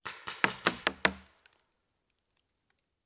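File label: Paintball Gun Impact; Sound Ideas; Guns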